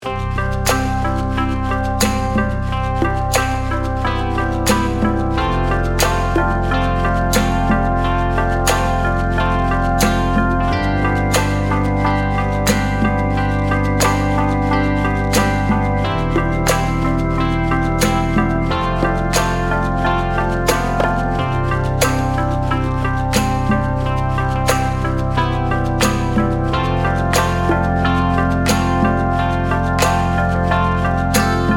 harpsichord; fire by music sound software

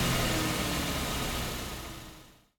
digital 8-bit car engine rev; lo-fi digital 8-bit glitch fx; nova sound

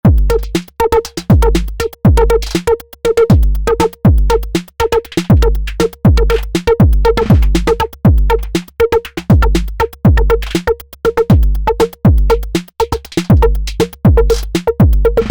drums

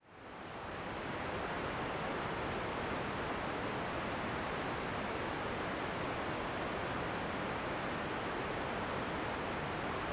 waterfall; noisepalette; sirenfx

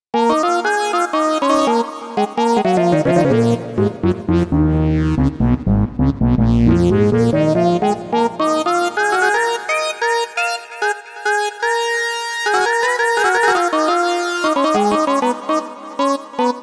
sq 8th 3xsaw; the transmitter soundset

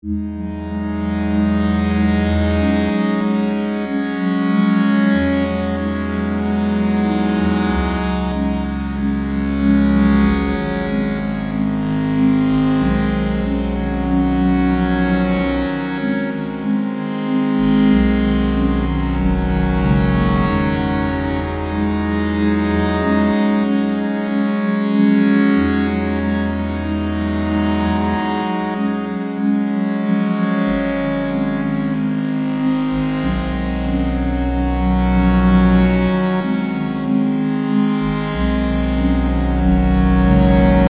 fat brass; bluewave by music sound software

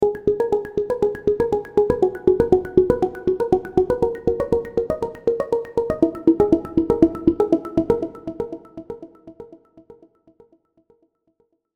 vital presets; chiqui audio; vital plucks presets

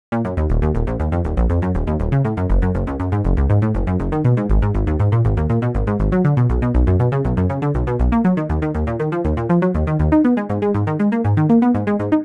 ampere bass; analog; the analog sound waves

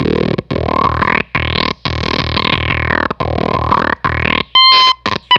Fuzztest drum

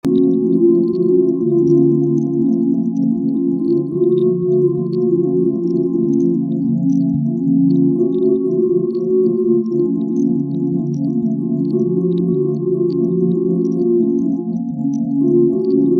synth grainbrain; grainbrain by xnullx

